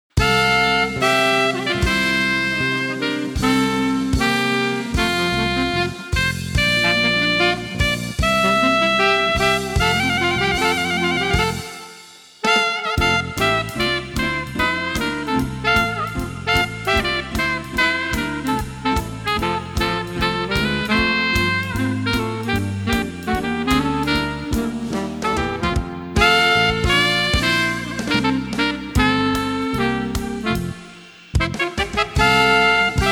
SAXES WITH RHYTHM SECTION; alto saxophone

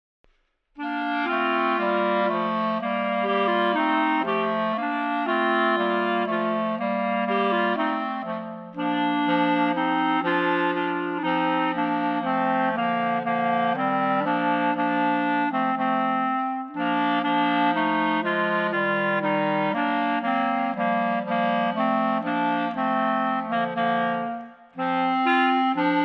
Clarinet Trio

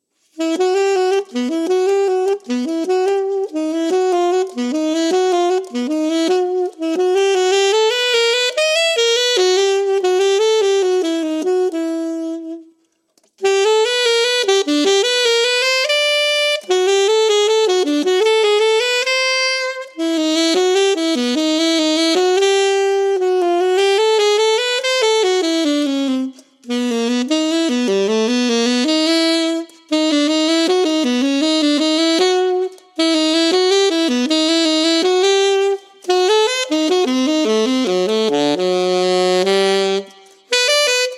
alto sax

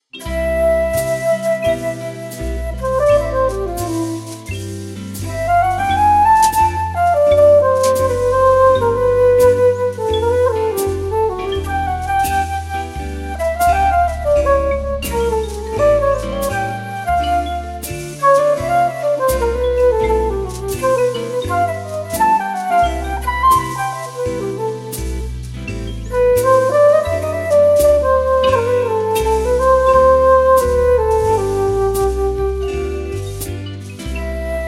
swing flute

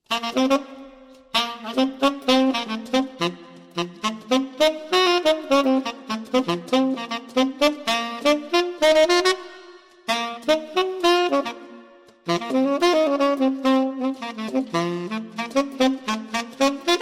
Tenor Sax; 52 melodic etudes tenor bk 2

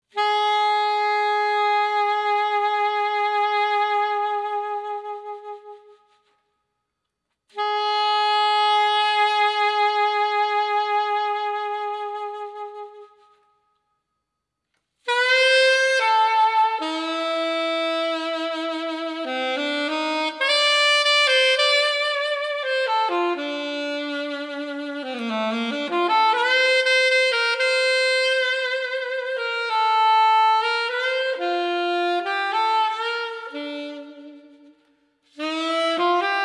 Vibrato example

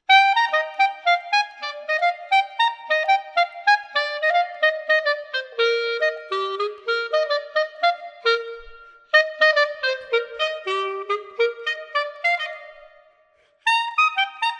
Clarinet; 52 melodic etudes for clarinet book 3

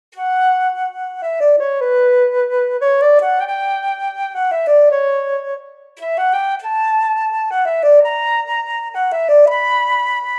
FLUTE

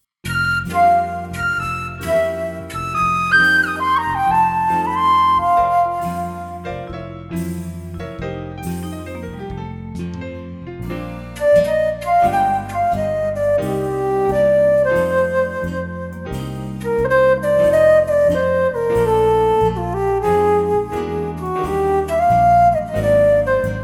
Flute

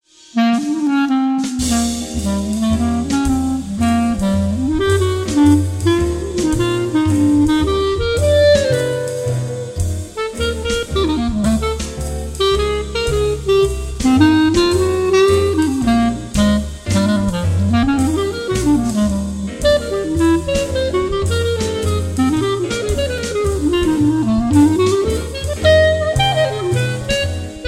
Clarinet; 12 jazz etudes based on popular standards clarinet book 2

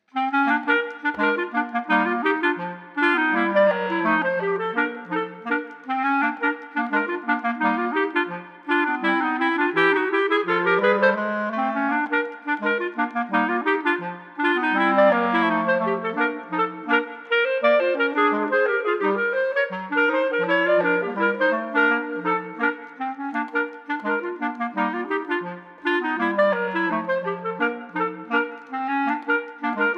Ragtime Clarinet Trio

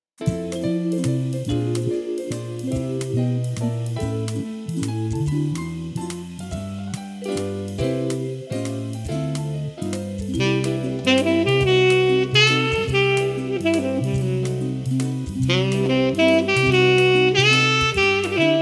JAZZY WALTZ TENOR

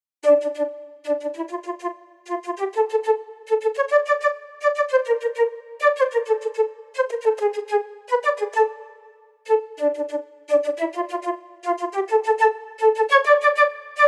FLUTE

